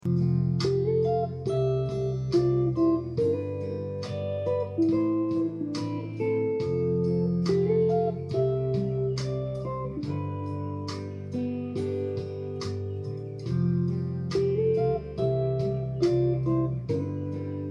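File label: 6ths a powerful way to play melodically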